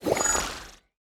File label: ui get experience